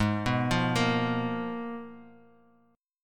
Gsharp Minor 6th Add 9th, Guitar; G#m6add9; arpeggiated; 3,2,5,3,x,x; 4F,4A,5C,5G,5C,6E; JGuitar

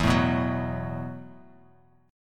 E Suspended 2nd Suspended 4th E, Bass Standard; strummed; JGuitar

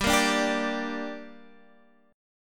G Minor G down, chord; Gm strummed

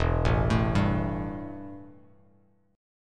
E Minor Double Flat 5th E, Bass Standard; arpeggiated; JGuitar

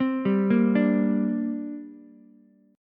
D 7th Suspended 4th Sharp 5th down Ukulele; D7sus4#5 arpeggiated

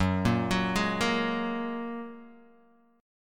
F sharp Major Add 9th F sharp, Guitar Open D; chordshape; down

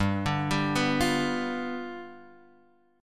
G 7th G down

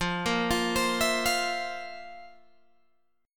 F Major 7th Suspended 4th, Guitar, Open G

